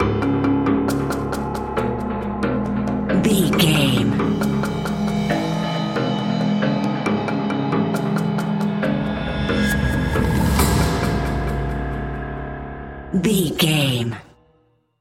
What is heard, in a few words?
wilderness tension